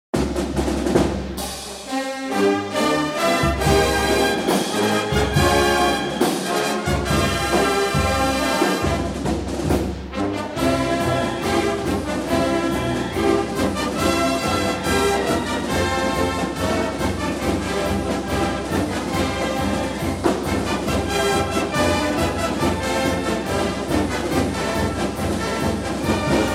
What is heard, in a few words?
Fanfare Waltz March; Tennessee